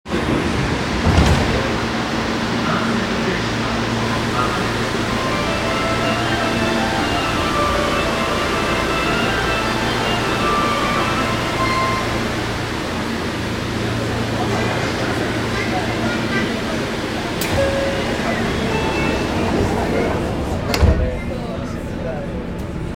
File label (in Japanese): 有楽町線銀座一丁目西, train sounds